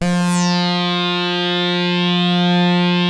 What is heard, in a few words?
SAW RES WET, SYNTH; Keith Emerson Signature Series